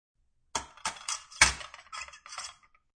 Metal Bar Tool, Sounds of a metal bar tool in use.; Bar Mixing Tool; Drink Mixing